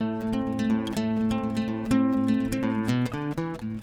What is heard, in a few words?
ACST GUIT ETHNO 125 A, Guitar; eJay